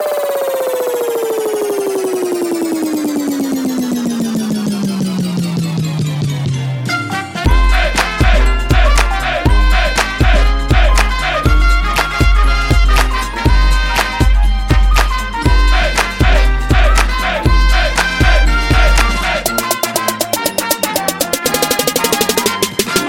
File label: Trap